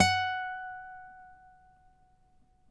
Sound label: NYL F#, FLAMENCO NYL; Spectrasonics; Hans Zimmer Guitars VOL-2